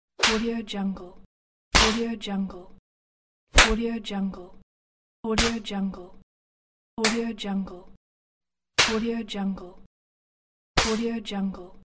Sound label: slap